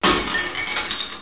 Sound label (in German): Glasbruch